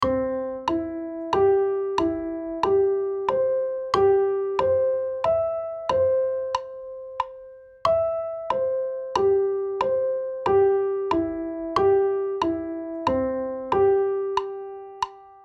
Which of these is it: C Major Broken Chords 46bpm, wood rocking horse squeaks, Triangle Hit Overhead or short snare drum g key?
C Major Broken Chords 46bpm